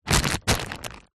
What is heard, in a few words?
packet plastic movement; foley